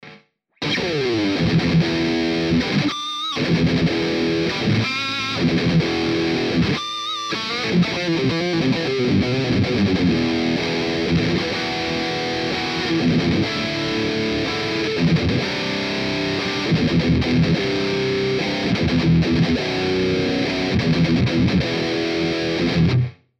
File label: Les Paul High Gain TS9